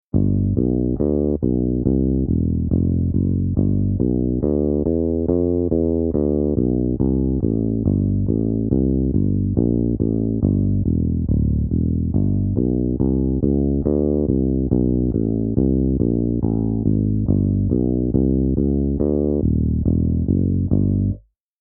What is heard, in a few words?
Bass